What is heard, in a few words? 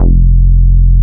JUNO E-BS F2; Drums In Your FACE!